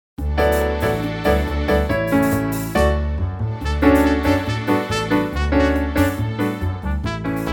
teddy bear karaoke

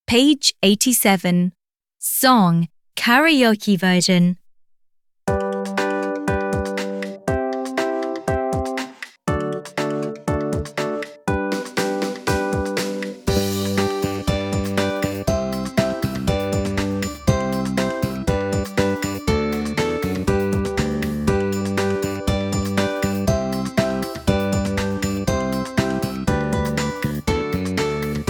song karaoke; happytoons; happytoons edycja polska; szkola podstawowa

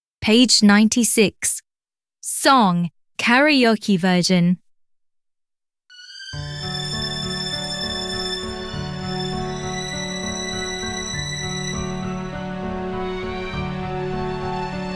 song karaoke

